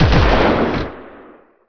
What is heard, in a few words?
buzzsaw gun; Emperor - Battle for Dune